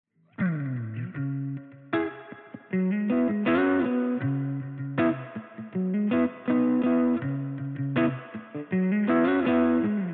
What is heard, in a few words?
US Combo Spring; DUAL CUBE